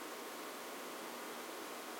stn aircon; tetsuon

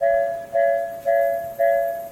crossing; tetsuon

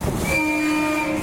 115 whistle; tetsuon

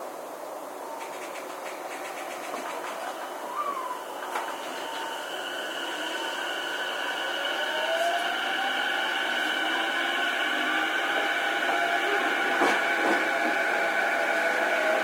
E233 go middle; tetsuon

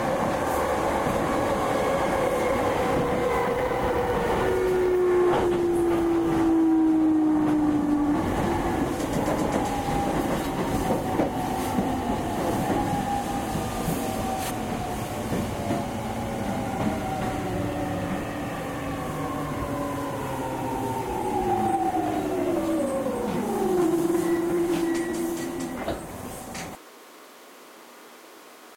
E231 middle stop; tetsuon

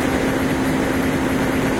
719 top tunnel; tetsuon

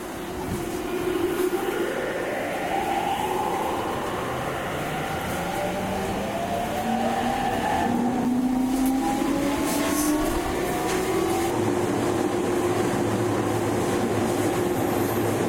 E231 sub go slow; tetsuon

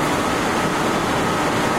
701 igbt top; tetsuon

